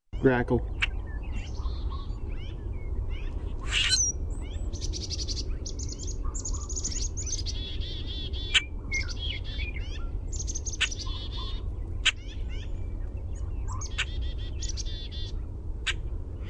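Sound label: Common Grackle chack squeak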